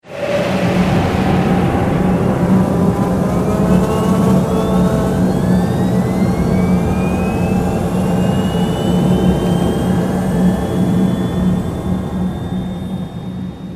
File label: Power on